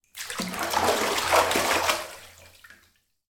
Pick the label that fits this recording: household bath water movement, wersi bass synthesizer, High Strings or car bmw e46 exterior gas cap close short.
household bath water movement